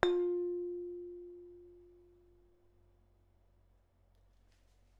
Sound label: large plate fabric hammer; bellplates